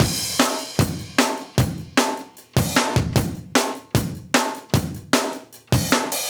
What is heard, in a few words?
152 live breaks